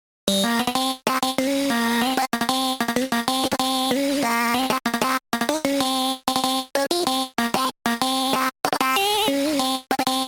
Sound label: Voices; fridaynightfunkin; bf pixel erect